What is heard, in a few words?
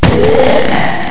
sgun, weapons; Quake; aamoon